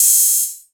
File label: HHO VRB, Yamaha RY-10, Drums